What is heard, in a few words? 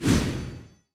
whoosh